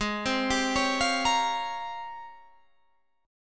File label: G sharp Major 13th, Guitar Half Step Down